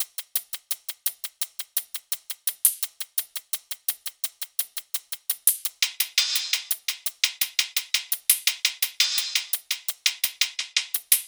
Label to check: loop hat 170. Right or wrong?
Right